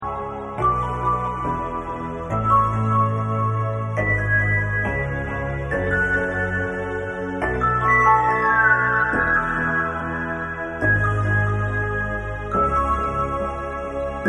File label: Star Tunnel Music